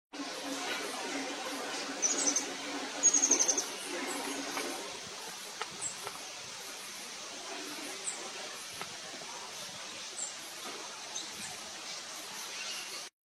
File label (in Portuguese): canto do beija flor de veste verde